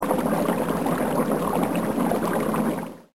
BOIL

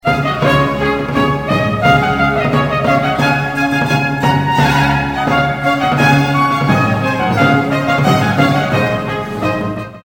FANFARE

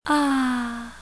Ahhh

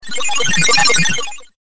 ALIEN CAR; Mono

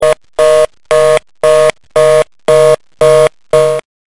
busy

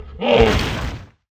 hippo die

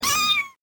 MEOW BEE

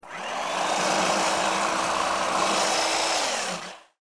Blacksmith

